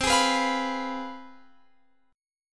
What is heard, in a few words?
C Major 7th Suspended 4th Sharp 5th, down, Guitar, Open F; CM7sus4#5 strummed